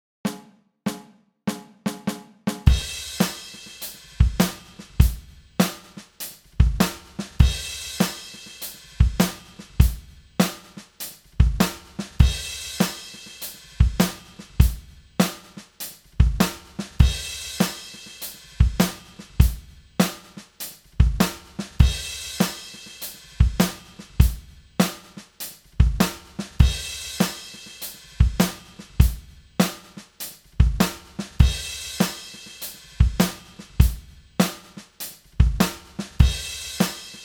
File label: Drums Metal Swing Jam